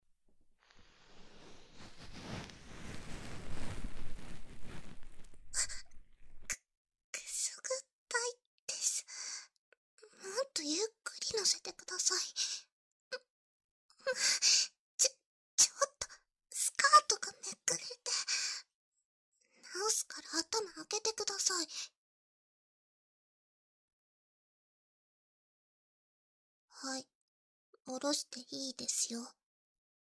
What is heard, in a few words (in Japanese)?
右 耳かき パート